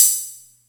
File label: Atlantex Music Percussion Computer HH closed, drum machine